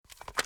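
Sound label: ak47 reload empty movement; Tacz1.1.5+; RSWS 1903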